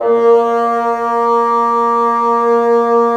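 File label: WND BN, wind bassoon; LA Composer vol. 3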